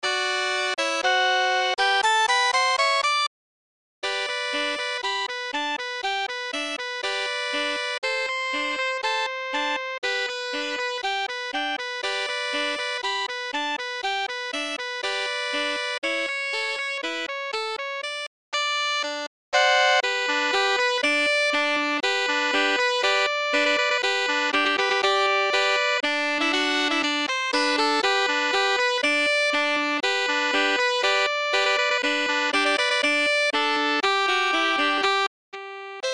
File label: Sax Trio